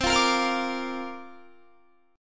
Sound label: C 7th Suspended 2nd Suspended 4th, C, down, Guitar; C7sus2sus4; strummed; 4F,4A,5C,5G,5C,6E